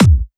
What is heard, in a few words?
BD Punchy; Vengeance Samples; Bassdrums